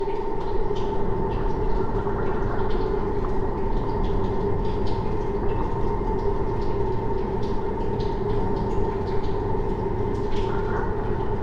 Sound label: ambiance data center corridors